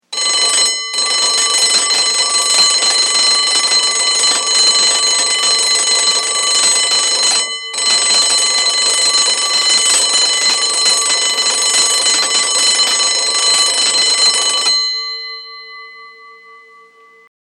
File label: Electric School Bell Breaks; Musical